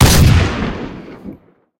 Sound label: ns45 singleshot, guns